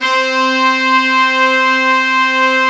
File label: ARM BRASS C2, Synth; US ARMY